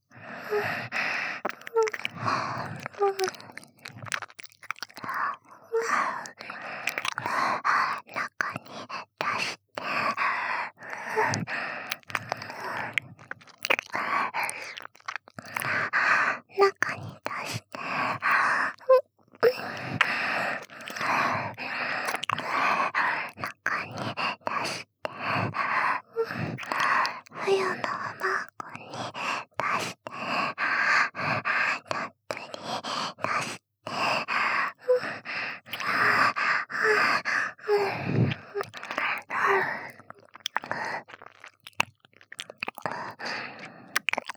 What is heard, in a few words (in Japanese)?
中に出して 耳舐め喘ぎ